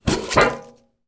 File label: tin chocolate sweets lid open; foley; sound effects; sound effects one